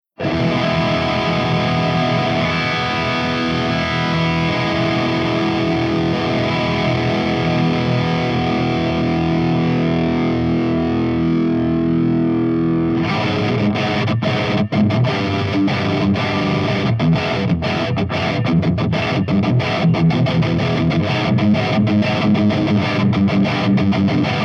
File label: EVH5150 CH3 HIGH GAIN V30